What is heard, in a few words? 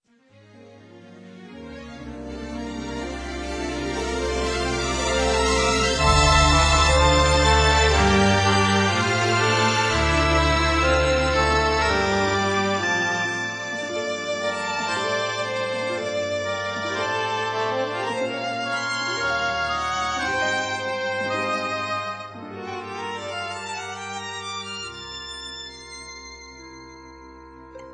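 orchestral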